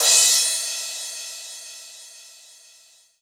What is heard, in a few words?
Crash Cymbal; Korg NS5R